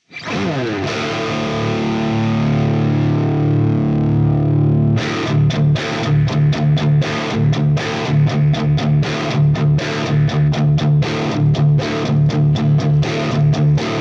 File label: Z-TONE VARITATION